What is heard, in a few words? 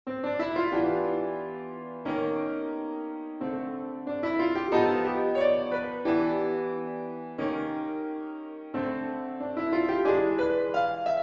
Blues